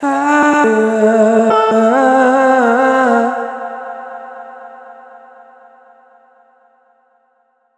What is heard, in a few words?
Soul Vocals Dm (Wet) 140 BPM, Melodies; 99Sounds x Monosounds